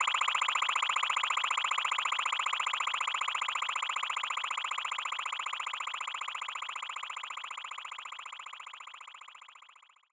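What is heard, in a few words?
Common Gauge Up Loop; Level Gauge Up Loop; Se Sys Common Gauge Up Loop; Se Sys Lv Gauge Up Loop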